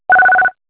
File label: Business Rings